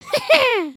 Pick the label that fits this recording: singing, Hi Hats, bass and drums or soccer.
soccer